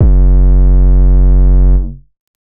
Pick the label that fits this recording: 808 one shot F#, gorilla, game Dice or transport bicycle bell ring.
808 one shot F#